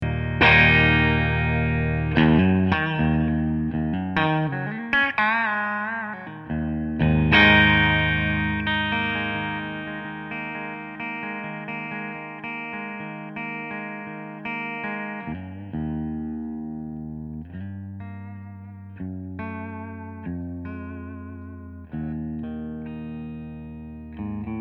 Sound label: clean